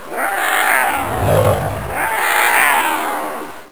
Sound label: Sabertooth Tiger Roar; Saban